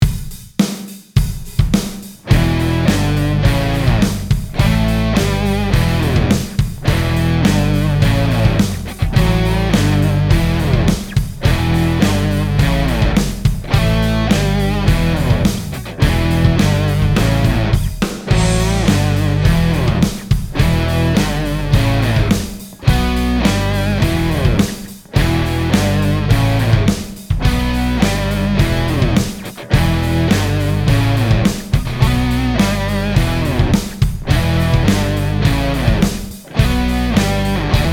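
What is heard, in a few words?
Single strings riff E G A playalong; E-Gitarre; Einzelsaiten Riff; Dein Erstes Spiel auf der E-Gitarre (Grundkurs 3)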